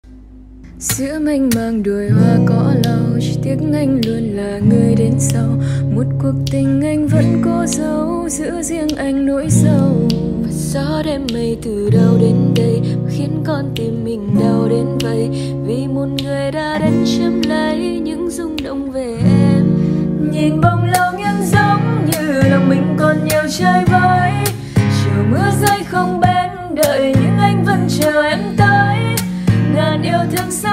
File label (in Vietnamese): Cover Giong Nu